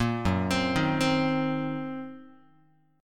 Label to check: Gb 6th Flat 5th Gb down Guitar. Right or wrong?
Right